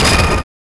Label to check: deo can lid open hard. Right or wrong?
Wrong